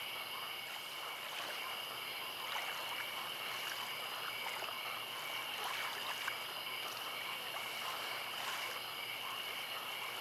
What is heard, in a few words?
Ambience - night lake; Sim City Societies